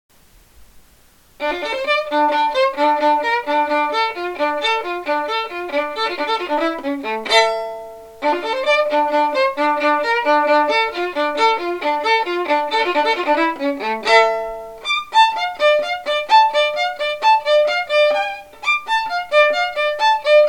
Improvisational Violin Shredding, violin solo: Some Improv. "SHREDDING"